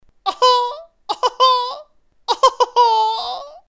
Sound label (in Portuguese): risada tripla